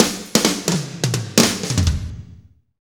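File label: slow shuffle, Roland; Northstar; Drumscapes Roland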